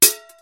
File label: sword clink